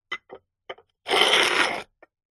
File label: foley wall tile small short scrape movement on concrete; zapsplat